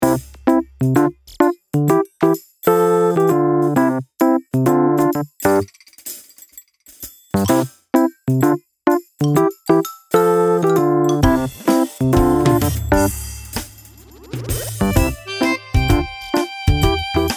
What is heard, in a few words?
bgm